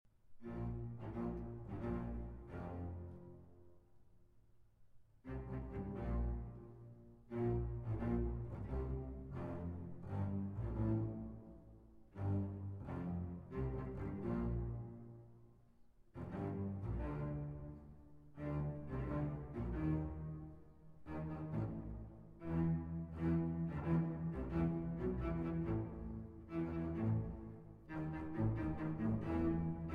Alto Saxophone Concerto in B-Flat Major Alla marcia - Tarantella